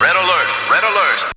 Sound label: VOX